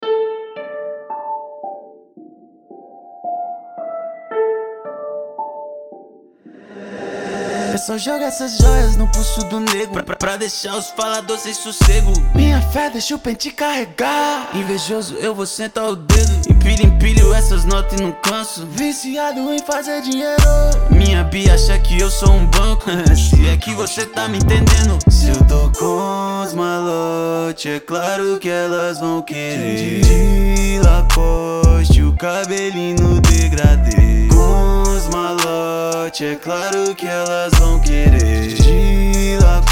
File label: rap trap